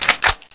xm8 draw, weapons

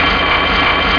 m134 spin, weapons

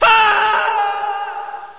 fall falaise trigger; de frosty

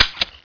tmp clip out

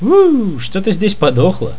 boo

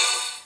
Spell Shield Impact; warcraft3

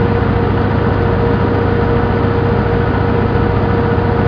machine; de arcticzone

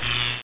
hook current

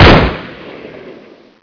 f2000 shoot

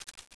zoom, weapons

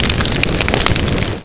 molotov fire

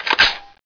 m16a4 bolt pull, weapons

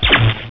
hook lightning

